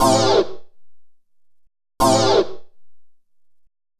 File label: Synth Stab, EDM; Sonic Foundry (Sony Creative Software); Afterhours EDM; Retro Active